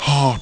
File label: heart; ST-XX Amiga Soundtracker Sample Packs